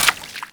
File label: Steps Water, Footsteps; Foley; Warframe; Grineer Royal Jester